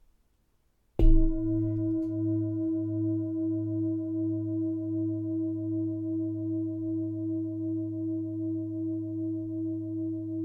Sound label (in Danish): Klangskaal, klang og syngeskaal xl